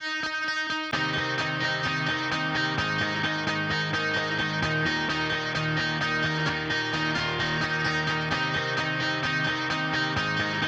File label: Mix Gtr Solo